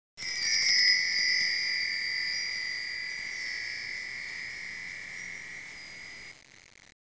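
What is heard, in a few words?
WIND CHIME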